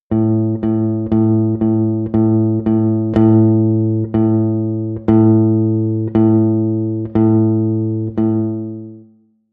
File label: eighth triplet tied quarter triplet tied